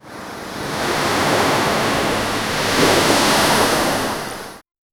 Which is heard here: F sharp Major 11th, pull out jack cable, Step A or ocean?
ocean